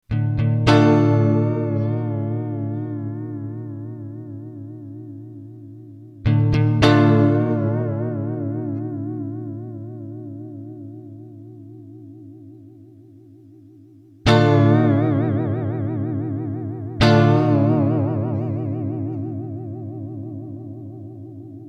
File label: Vibrato